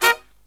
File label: LOW HIT, Brass